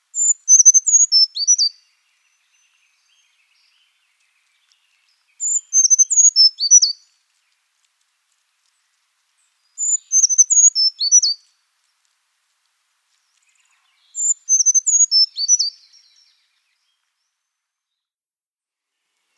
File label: Brown Creeper (Eastern)